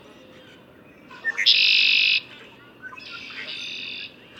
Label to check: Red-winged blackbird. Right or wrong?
Right